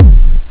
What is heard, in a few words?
d50 bdrum; steel; Amiga Soundtracker Sample Packs